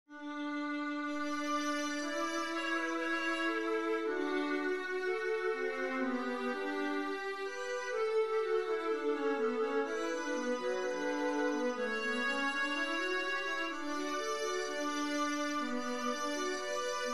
viola D major scale duet; scales

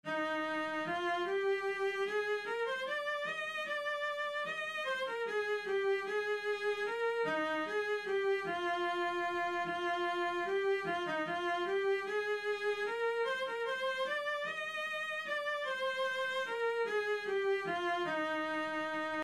minuet Vla, viola

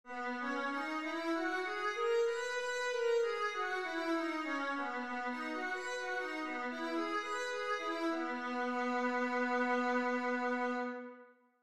viola b major, scales

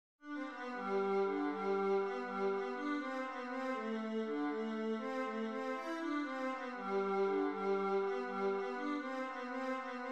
irish washerwoman vla

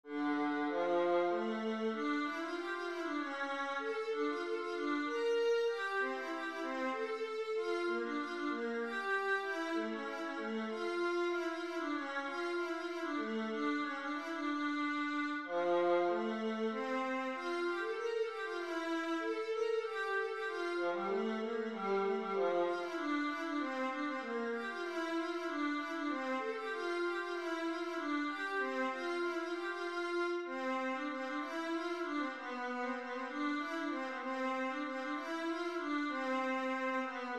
rondeau Vla

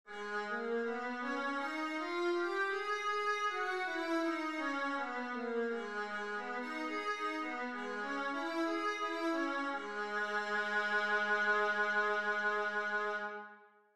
viola g sharp minor, scales

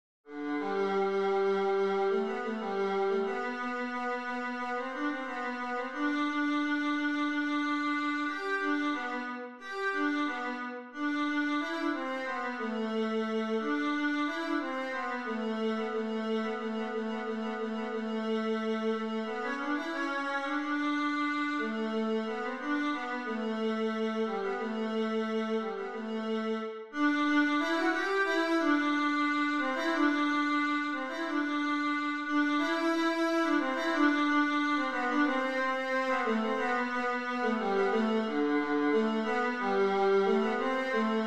viola melody

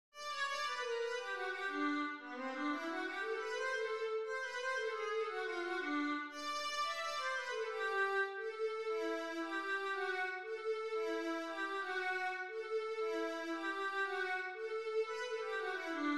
jarabe vla

